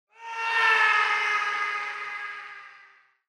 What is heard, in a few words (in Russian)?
strashnye zvuki